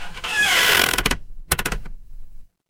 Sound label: door creak